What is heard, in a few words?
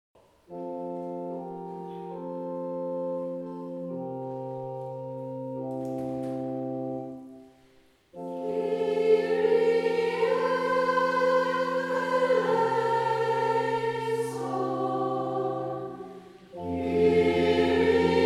Kyrie SATB; St Andrew